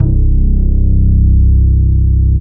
fat double bass; Jungle

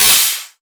SOUND EFFECT, Metal; AKAI S6000 CD-ROM - Volume 1

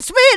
Female Vocals; Houseworx

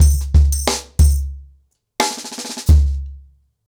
Nuclear 90BPM, ACOUSTIC DRUMS

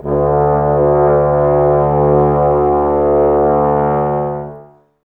BRS TBS D, Woodwind Brass Ensemble, Brass

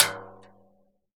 CASCARA, Synclavier Percussion; ILIO; Synclavier Percussion 2; World & Orchestral

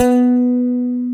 stick, Chapman Stick; Analog Odyssey

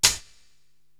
HH Wispy Open, Korg TR-Rack drum machine

